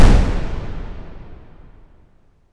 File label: missile destroyed; m-intercept; android